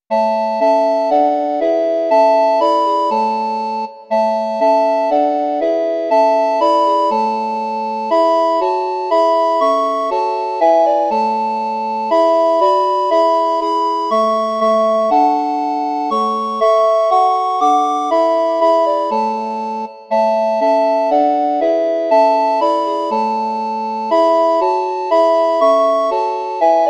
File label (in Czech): kvarteto zobcových fléten; Imaginativní verze